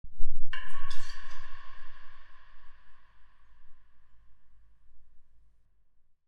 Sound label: Metal Water Bottle Dark Droplet